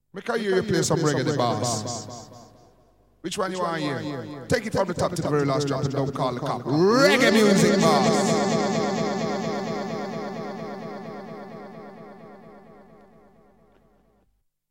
Jingle

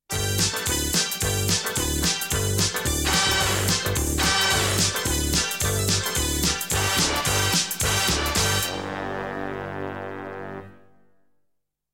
Jingle

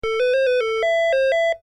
Ring

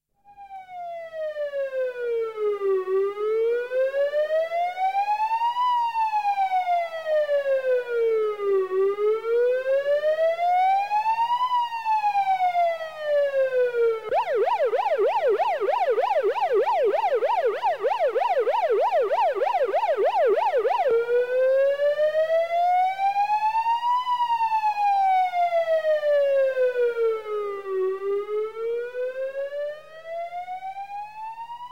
Jingle

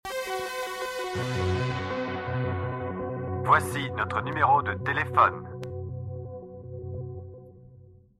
Jingle

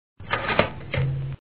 Toaster

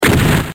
Ric gun

